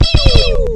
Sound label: pewpew machine gun